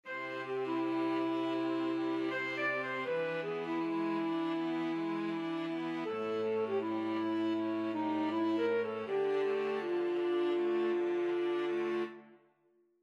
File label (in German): 1 Instrument Streicher